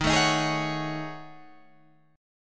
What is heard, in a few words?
F sharp Minor 6th Add 9th, Guitar